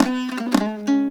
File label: HALAM MELODY; Best Service; World Colours